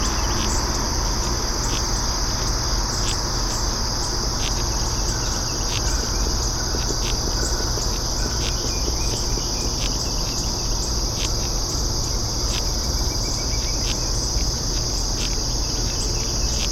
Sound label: beetle chewing